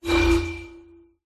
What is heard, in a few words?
futuristic unlock game tone magic spell cast conjure craft mobile app special